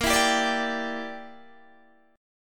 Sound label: A sharp 6th Flat 5th A sharp, Guitar DADGAD; 20,19,17,19,17,17; down